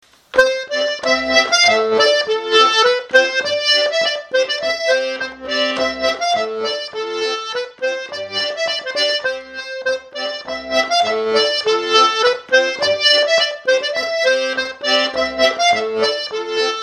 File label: polka; du Perigord